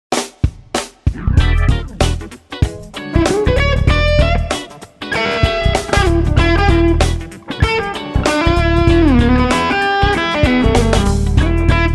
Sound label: Rack Funk